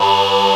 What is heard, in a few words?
AAH PULS, STRING CHOIR; Pro-Rec; Synthsonic